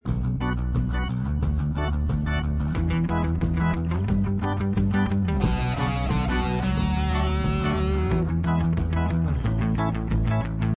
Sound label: Game Music; jakes pirate marble raceway